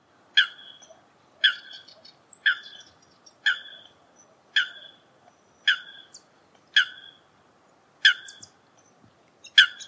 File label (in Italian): FOLAGA